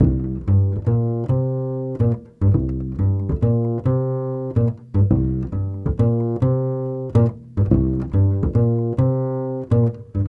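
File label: 140 Trad Jazz Doublebass (3-4) C major; Pro Tools Essential Loops; Digidesign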